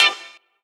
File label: CHAK CUT; Rawcutz; Nu Forms